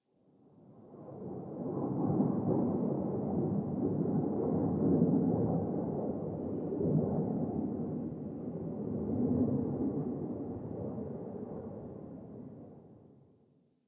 Distant Ambient Fighter Jet

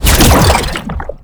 Treasure Box Proj Throw; Warframe; ManInTheWall

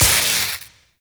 Vial Ability Shock Shatter; Warframe; Warframes; Alchemist; Elements

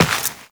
Step Dirt, Footsteps, Walk; Warframe; Characters; Tenno

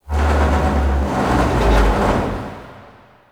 Destruction Texture; Warframe; Corpus; Railjack

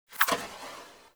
Quick Draw Tail; weapons; Warframe; The New War; Omicrus

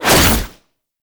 Fist Claw Whoosh; Weapons; Warframe; Tenno; Incarnon

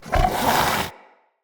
Corrupted Battle Dog Breath Fill, Animals, Vocals

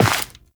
Step Dirt, Footsteps, Walk; Warframe; Tenno

